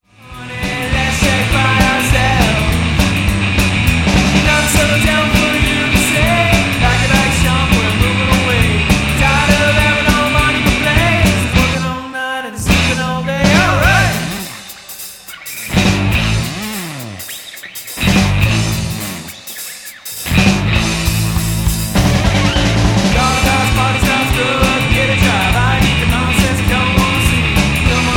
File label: Wrecked Chords